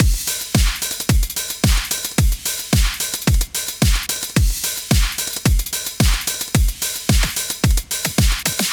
drums, just a drum loop that I've chopped up and rearranged, plus some extra hi-hat layers; Rhythm Quest